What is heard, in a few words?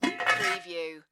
Pot Lid Lift Open